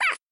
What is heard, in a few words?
puff